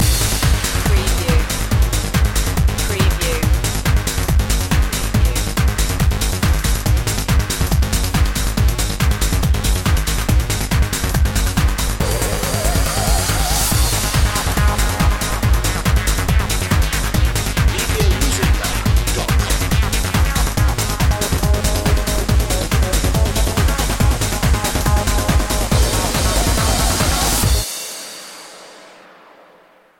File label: uberdrop